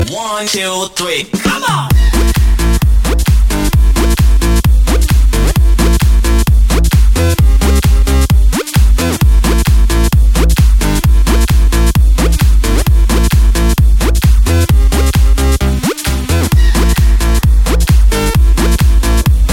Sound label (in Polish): muzyka klubowa